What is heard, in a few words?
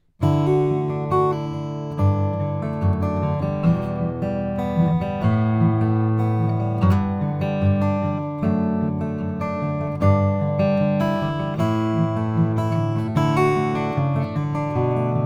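serracini sd 00 multiscale, guitars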